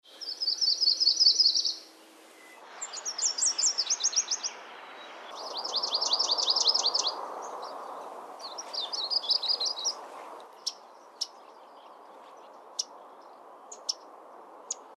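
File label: yellow rump warbler; calls; Audubon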